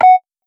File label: atm cash machine